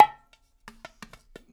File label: BONGO; InVision Interactive; Percussion Slam; ZOMBIE